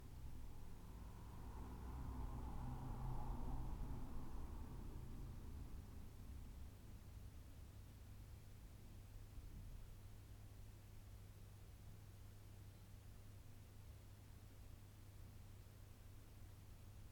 Room Tone Hallway Small House Calm Environment Long